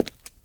Stone; kult

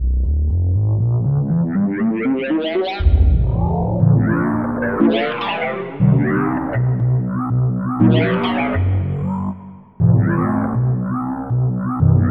Wah Walker; Onj's Soundfont

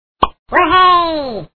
WhooHoo; Word-Safari

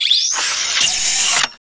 reload, srifle; weapons

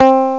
pick bass; gmplay

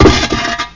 shovel

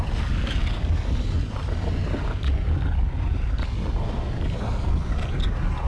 lava loop; ambient; Shadow Man

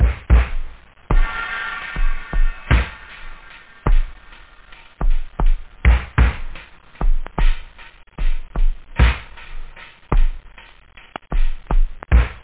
Funk; da capo vol1; instruments

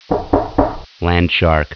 knock

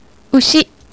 cattle

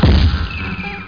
crunch

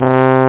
trumpet

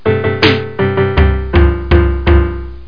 PIANO

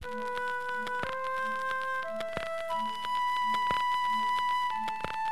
fluty, keyboards; HipHop Keyboards; LiveAct Pool 1

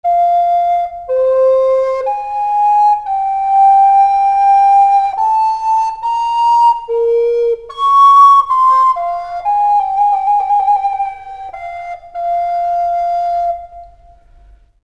recorder

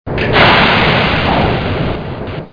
Door Sound

